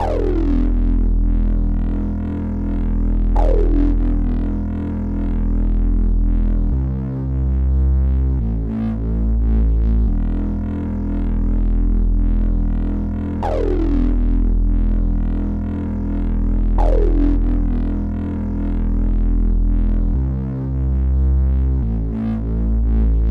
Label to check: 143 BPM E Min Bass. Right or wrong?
Right